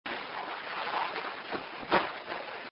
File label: evp cleaned up